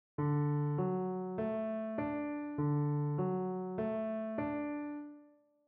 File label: arp